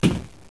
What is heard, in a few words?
player metal; svencoop; sence; mistake coop